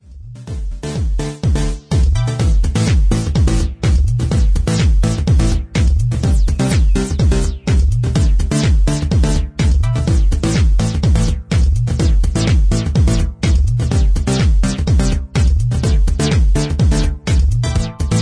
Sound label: Instrumental